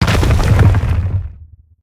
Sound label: Rock Throw Impact; Evolve; Meteor Goliath